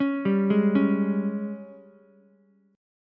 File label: G sharp Major 7th Suspended 4th, Ukulele; G#M7sus4 arpeggiated; JGuitar